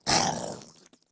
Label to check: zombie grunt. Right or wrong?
Right